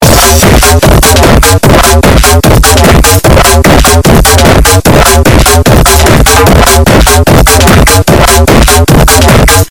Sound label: hard bass